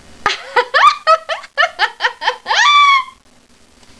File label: Laugh LOL Girl